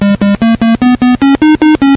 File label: bleep